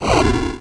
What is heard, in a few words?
Flame